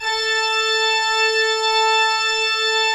A4, Cathedral Organ; ILIO; Propeller Islands Cathedral Organ

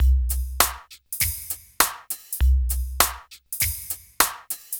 Smooth, R&B Drums

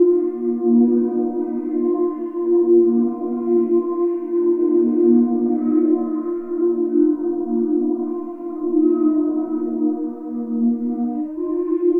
Spirit Caller, Flutes, Ethereal Textures; Sonic Foundry (Sony Creative Software); Ma Ja Le Ethereal Textures

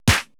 PERC MIX

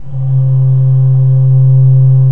C2, Cathedral Organ; ILIO; Propeller Islands

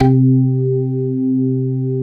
organ, 135 BPM, drum & bass; Ueberschall; Drum & Bass Flash Trackz

